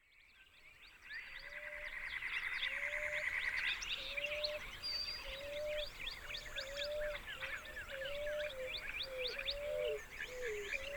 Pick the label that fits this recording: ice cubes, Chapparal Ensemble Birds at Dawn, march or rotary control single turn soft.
Chapparal Ensemble Birds at Dawn